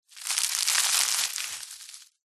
foley foliage dry crush squeeze crunchy crispy thick